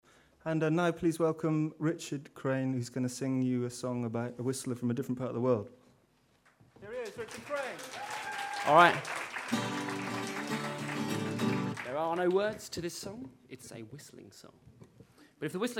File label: mexican whistler